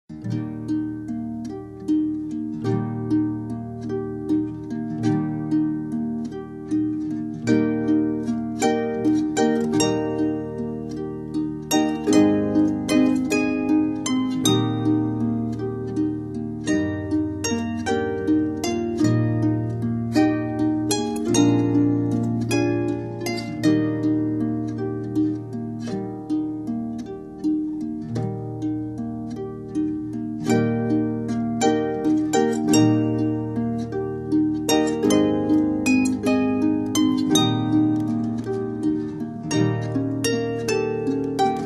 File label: Harp